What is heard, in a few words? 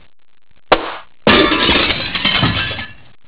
GLASS